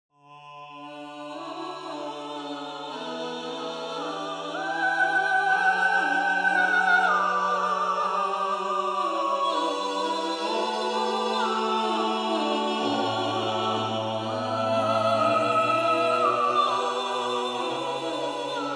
counterpoint; Artinfuser Counterpoint

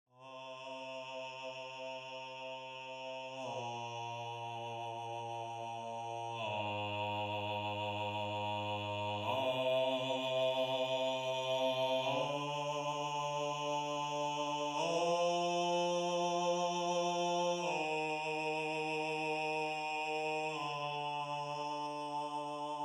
Bass, counterpoint

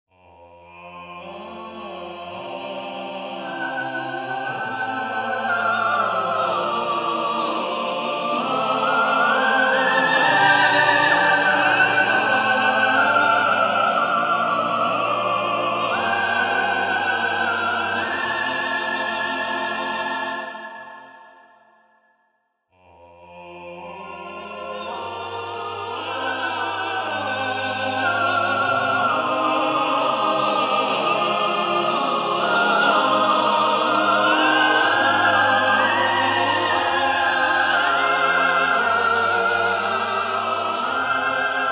counterpoint; Artinfuser Counterpoint

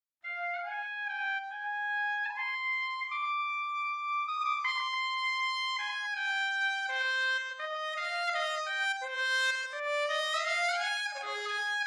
Trumpet

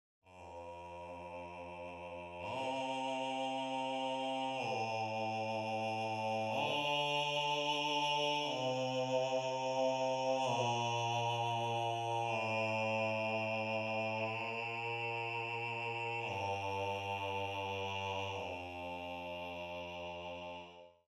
Bass

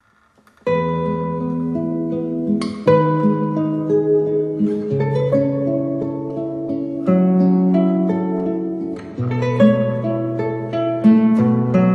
Cavatina

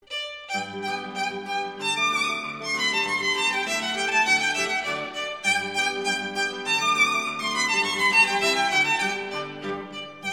Duetto in G Major - Andantino; Paganini

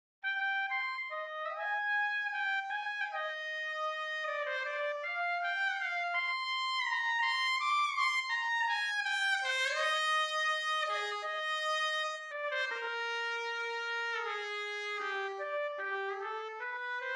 Trumpet

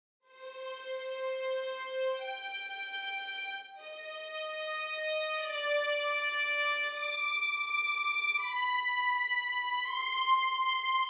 Violins

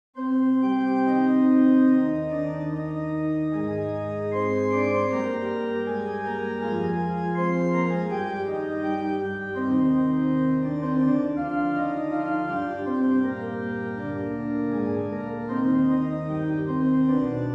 Organ

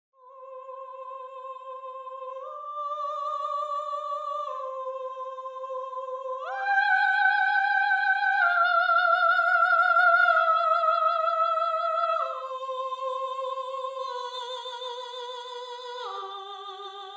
Soprano, counterpoint

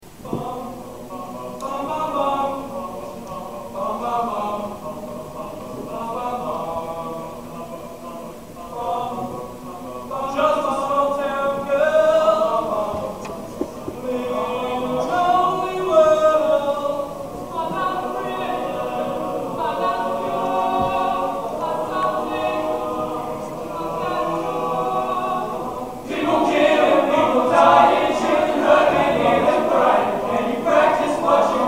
Part Song; Middleton; Christs Hospital; Choir

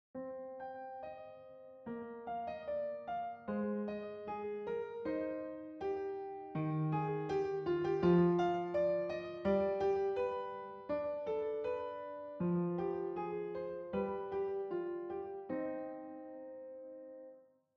Piano

